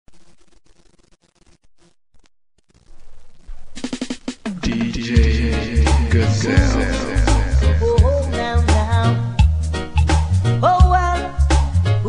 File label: Reggae Dancehall oldies mix